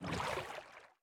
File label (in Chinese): 船在水中划桨声